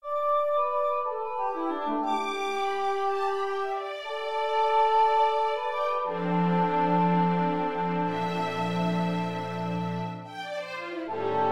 Pastorals English horn Strings

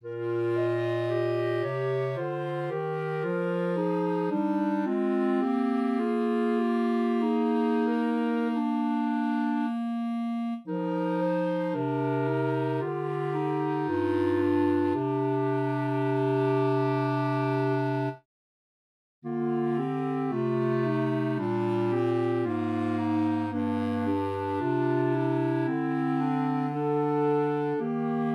Chorale, clarinet choir

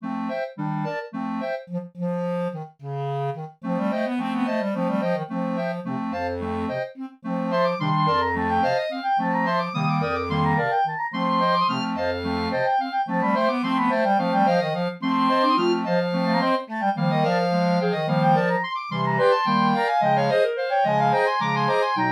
Harmonic Waltzing Contrabass, clarinet choir

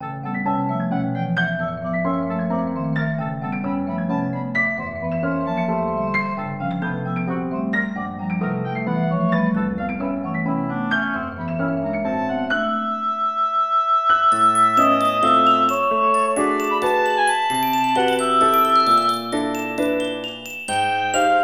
Waltz, oboe percussion piano

